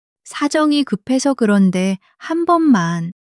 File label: struggle